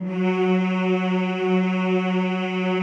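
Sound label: choir F#2, Mmhh; Sound & Vision; Gigapack I CD 2; CHO Mmhh + Rrrr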